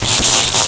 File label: ROCK